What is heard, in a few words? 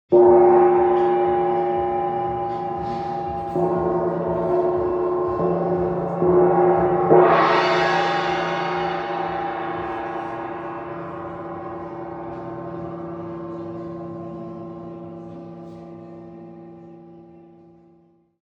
wind gong 52 cm; gongs